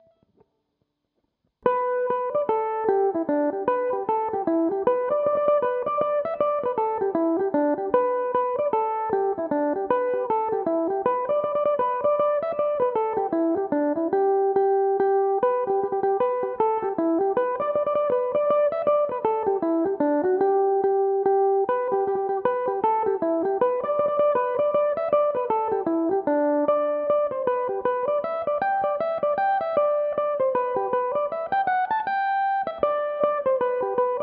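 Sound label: G major, Irish mandolin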